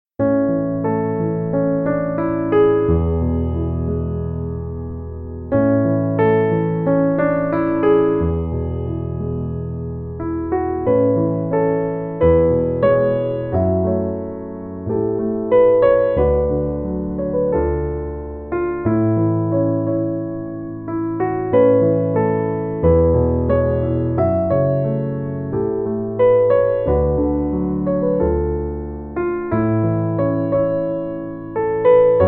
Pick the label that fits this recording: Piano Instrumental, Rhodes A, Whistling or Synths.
Piano Instrumental